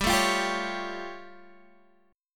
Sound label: A Augmented 7th G